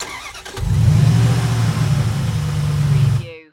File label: Truck 4.7 Liter Start Up Engine Perspective